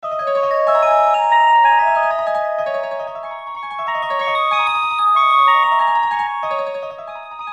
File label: wind note